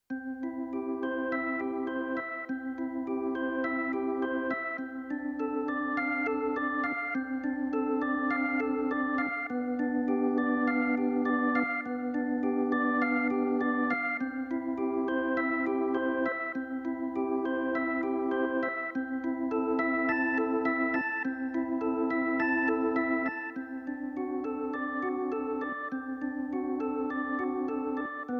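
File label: Jazz Organ, digital piano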